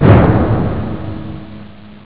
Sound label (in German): pauke; Amiga Soundtracker Sample Packs